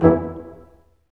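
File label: Large Ensemble, Brass Staccatos; Roland LCDP08 Symphony Orchestra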